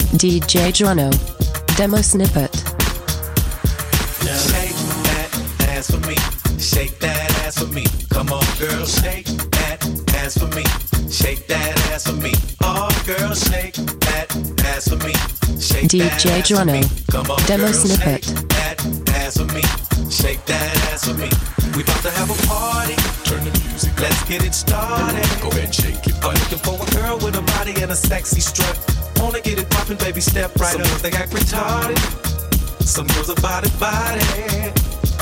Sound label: Ebm 107 bpm; REDRUM